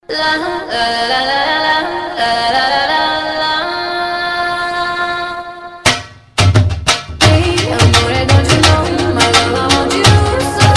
Nice Remix

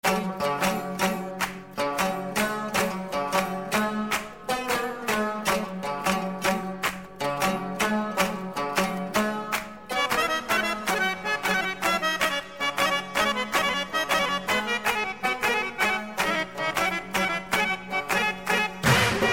Nice Classic Beats

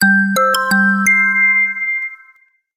Notify Tingle

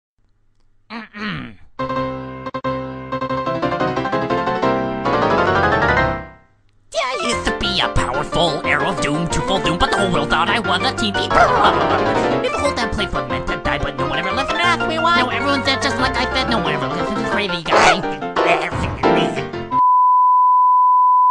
Gallop

